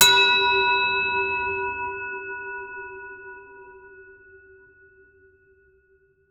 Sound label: one deep bell toll with